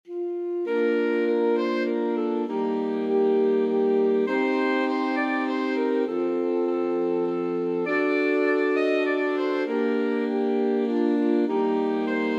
alto sax quartet

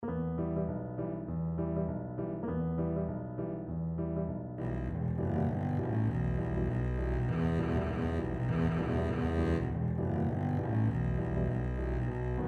wellerman, double bass

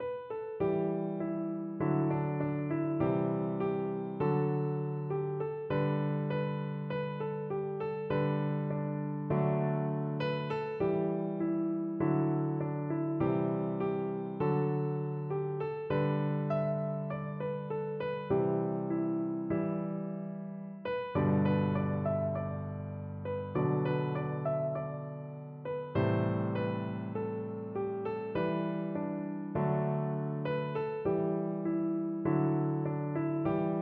the parting glass piano

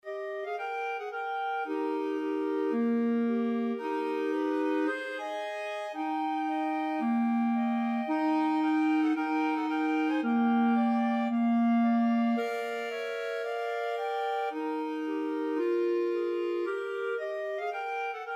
clarinet trio

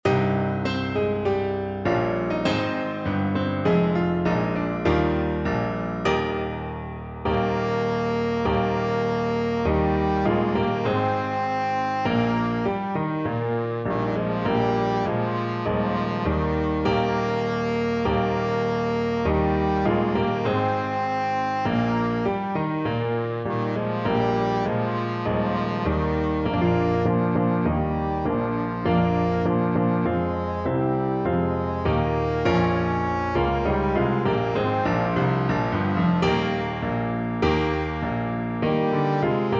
march trombone

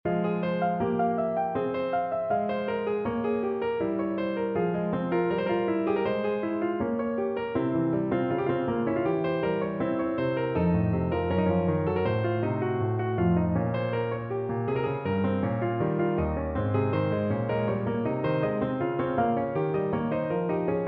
piano

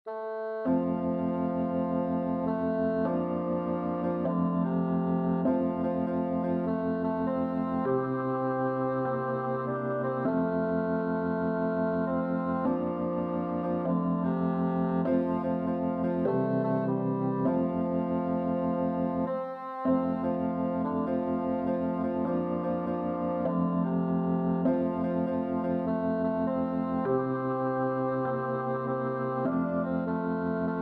bassoon

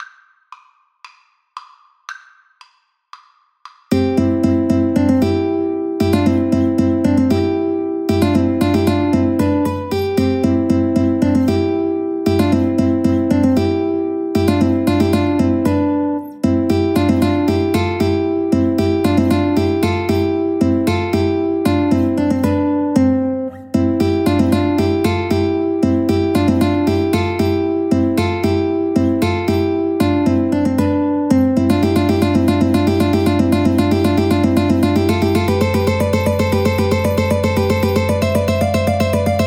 Ukulele Trio

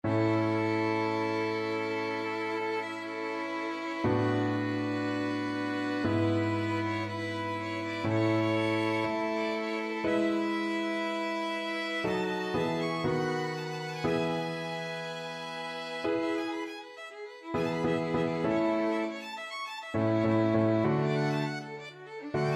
adagio and allegro in a major, violin trio; hwv 406

